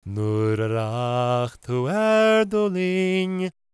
GAELIC, World Voices; ILIO; Vocal Planet

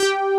MGLOW BS; Pro-Rec - Synthsonic

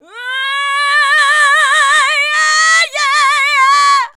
UUUH, SHOUTS; Best Service; Hyper Dance

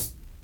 CHH, Dance Drums; Best Service ProSamples vol.15; AKAI

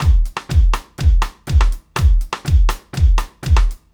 Straight Kit, World Pop Beats

